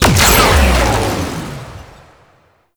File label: Antimatter Despawn; Warframe; Nineteeen Ninety Nine; Deep Archimedia